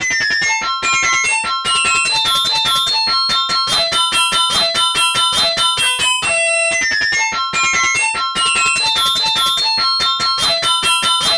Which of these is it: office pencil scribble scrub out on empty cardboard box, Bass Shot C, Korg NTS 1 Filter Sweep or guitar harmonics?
guitar harmonics